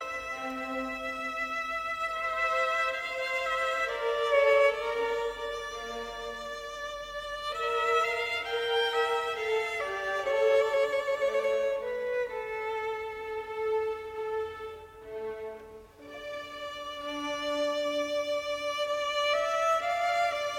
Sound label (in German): concerto a moll op 3 nr 8 fuer zwei violinen und orchester larghetto